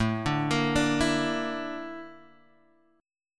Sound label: Bb Major 7th Flat 5th A